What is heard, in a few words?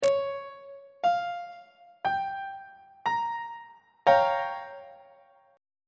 g half diminished 7th 2nd inversion on treble clef